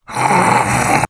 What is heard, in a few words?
attack; slender; zombiebm